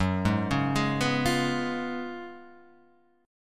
F sharp Major 7th Suspended 2nd Suspended 4th F sharp, Guitar; Half Step Down